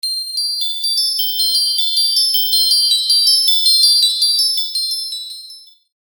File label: Sound of joy